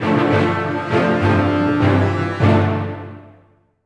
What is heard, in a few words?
Triumphant Victory; The Legend of Gwen; windows